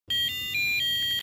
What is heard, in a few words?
HP41C BEEP